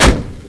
tank fire; Army Men TiS

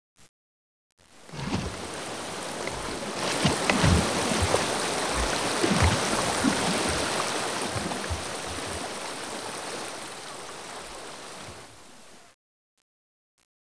tide

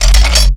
shockwave ammo; Daikatana; items

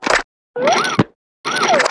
hand grab

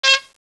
Honk; Princess and the Pea; SoftKey

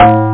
claves

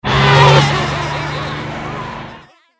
SFX Transition; bob espo

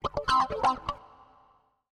ELECGUIT, HOUSE, 125, A; eJay; Guitar

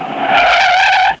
SKID; Alien

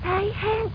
Dangerous; CutyMate 1.0

